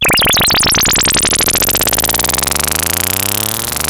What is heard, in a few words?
Moog E2; Hollywood Edge; Giorgio Moroder Rare Synthesizer Collection; MLTI.MOOG